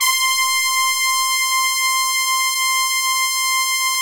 BRASS, Brass Bones; Club-50 - Foundations Roland; Brs Layer

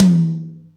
Yamaha EX-5

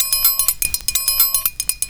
PERCS, 70 s Breakbeats

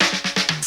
DRUM FILL; Crypton; DrumBase5000

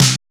RX snare lo; Drums In Your FACE!; NEWJACK

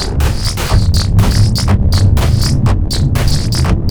Hoppin Lasers, Ethereal Textures, Beats; Sonic Foundry (Sony Creative Software); Ma Ja Le Ethereal Textures

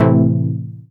junoic C4, junoic bass; Best Service Dream Experience

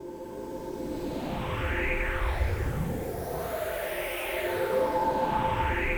PAD, DIGI-PAD

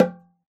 oxaca, percussion; ILIO

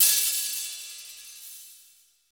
CYM 15, Real HiHats, 15 Hi Hats

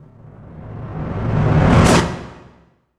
IMP WHOOSH

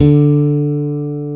Basses, Roland

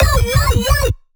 Misc Short FX

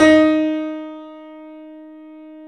Small Pianos, 3-D Audio Collection; E-MU Producer Series Vol. 5; 3D Multis

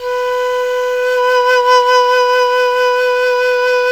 BANDI VB B4, BAN-DI FLUTE; Spectrasonics; Heart of Asia

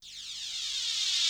NOISE, Production Effects

